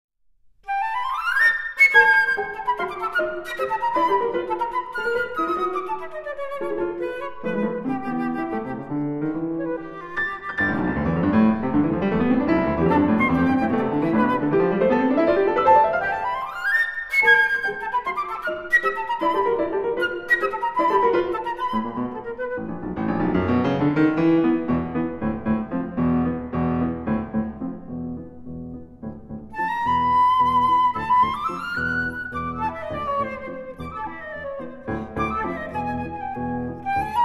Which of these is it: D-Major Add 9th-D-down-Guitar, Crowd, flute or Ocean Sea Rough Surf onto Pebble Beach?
flute